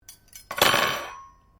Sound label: cutlery general drop bench, Household cutlery; Kitchen